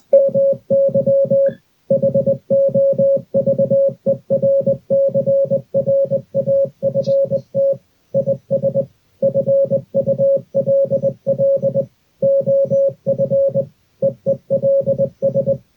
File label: hovercraft morse code